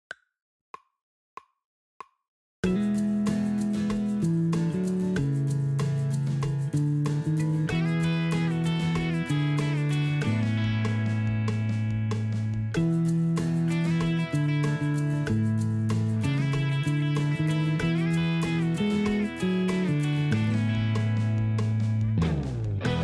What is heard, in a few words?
drumless